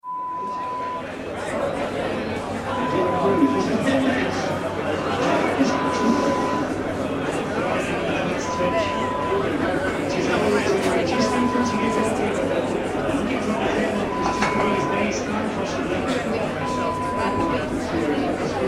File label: Football crowd sound